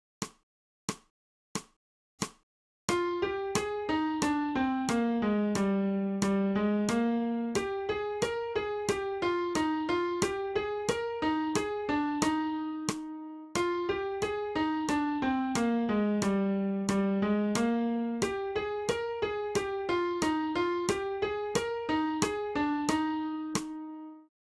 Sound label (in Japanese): 新フレットレス